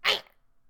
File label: die; capri